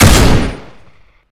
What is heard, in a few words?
paw; garrysmod